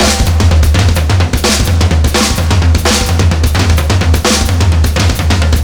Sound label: drums; szf